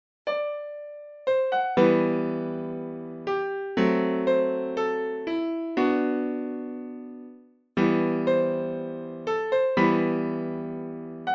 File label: Em to Dm Modulation